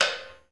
on off, Switch FX; Masterbits

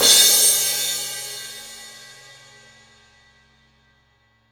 CYM, Real Drum Kit, FUNK KIT

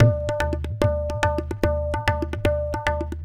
Tabla

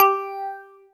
THROATY, PHASE; Big Fish Audio; Synth City

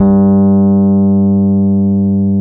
G 2, Electric Pianos; Wizoo

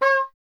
WND E. Horn; Miroslav Vitous; Solo Instruments

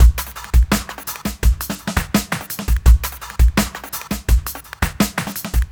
Drum Loop, Drum Loops

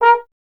Trombone A#0; Miroslav Vitous - Solo Instruments VOL-1; 90 s Sample CDs

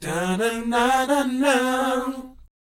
BOYZ G, Vocal Planet R&B & Dance; ILIO

